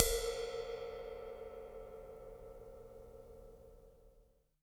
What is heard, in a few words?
Ride Acoustic Lofi